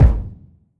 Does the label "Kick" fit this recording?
Yes